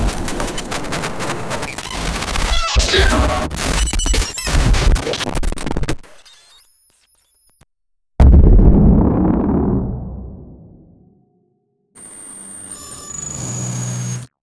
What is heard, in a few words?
boom digital glitch